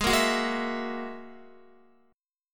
Ab 7th Suspended 2nd Sharp 5th, Guitar, 4E,4A,5D,5G,5C,6F; Ab7sus2#5 strummed; x,11,8,11,8,11